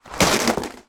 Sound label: household plastic floor mat unroll on floor